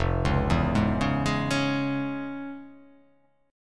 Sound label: F Minor Major 7th Sharp 5th